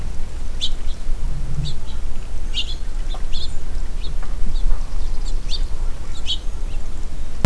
house finches

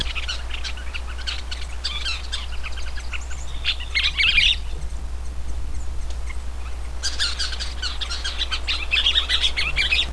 scissor, Scissortailed

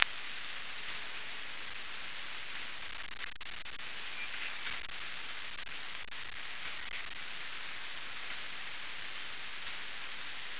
bullbat, Common Nighthawk

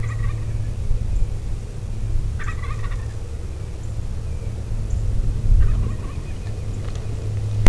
turkeys, Wild Turkey

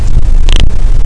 roadrunner

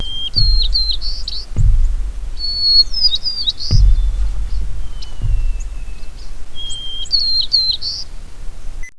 white-crowned sparrow

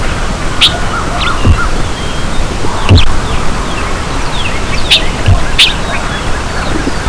bewick wren calls; BewicksWren

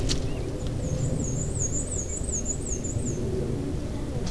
black white warbler

